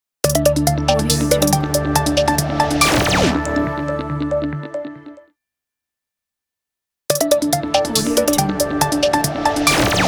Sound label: corporate elegant logo; ngmuzik